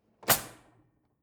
drawer cutlery close draw short hard

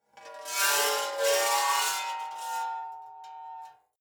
metal scrape rich

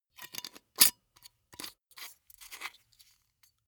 glass bottle close aluminium lid

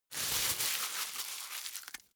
plastic bag rumble quick

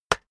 keyboard external enter hit push

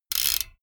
bmx bike crank freewheel chopped medium

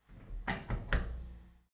cleaning machine close handle

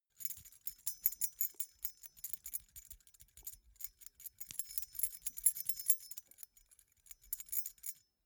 key ring small rattle air sequence soft

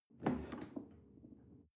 chair wood take soft

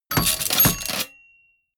typewriter

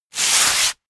sandpaper grind

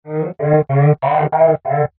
creature honk

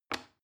plastic button small release regular

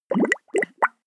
bubble fast short

